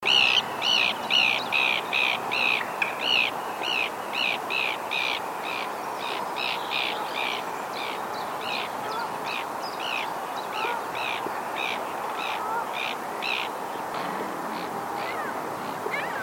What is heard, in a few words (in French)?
mouettes rieuses